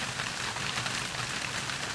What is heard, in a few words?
Hail